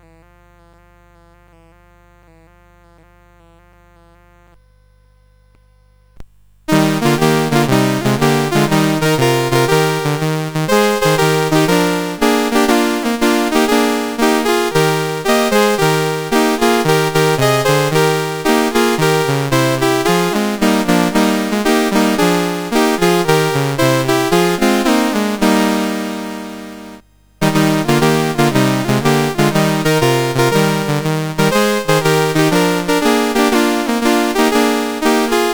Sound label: Here we come a Caroling, CSG8580R5